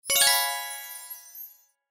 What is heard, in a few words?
SFX Symbol Win; slots; Steam Miners